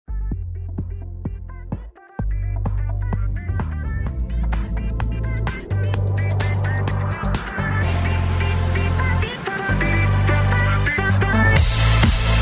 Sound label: Beep Bop bpm128